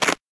break Plat Shake; todee and todpee

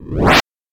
trans Up; todee and todpee

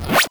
axe back; choppy orc

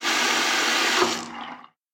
water Fill; todee and todpee

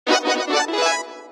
win med; aviamaster; Aviamasters

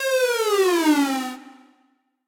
restart; todee and todpee

